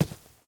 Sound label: grass run, step